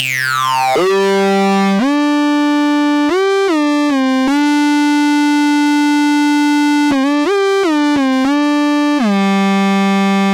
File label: DISCO SYNTH, 117 BPM, Cm